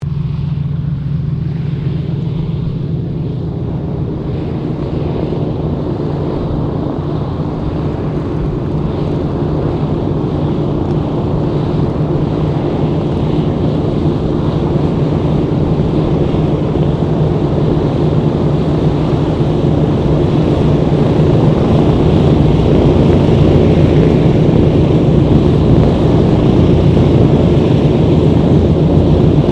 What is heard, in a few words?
AIRCRAFT LOCKHEED HUDSON IDLE TAXI DISTORTION, Hudson twin engine light bomber; Airplanes (Sound Effects:Vehicles)